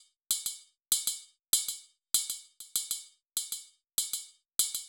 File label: Hip Hop Ride, Cymbals, Drums; Digidesign; Pro Tools Essential Loops